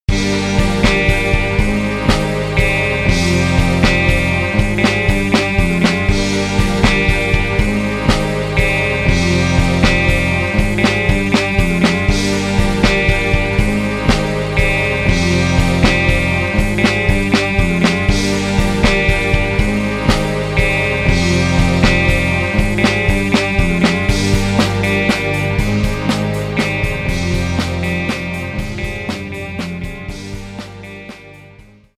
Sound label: Rock Tracks